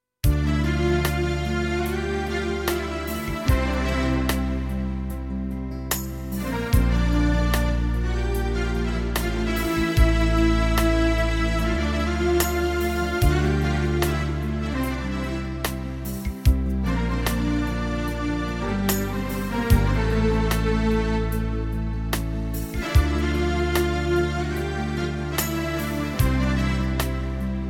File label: Arabic Gypsy